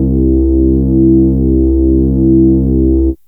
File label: ARP Odyssey